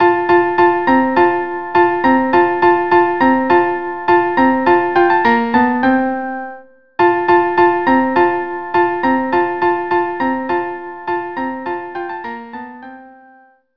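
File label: violin autumn violin viola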